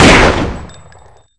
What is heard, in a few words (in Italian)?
PISTOLA; KINGPIN; weapons